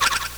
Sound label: ball roll; bros ball; DS; MARIO LUIGI PARTNERS IN TIME